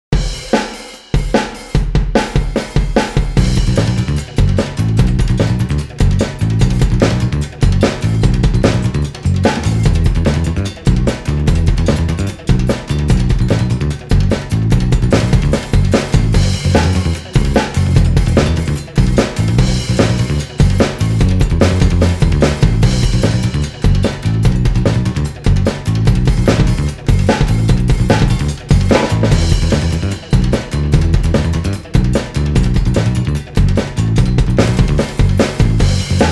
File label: rock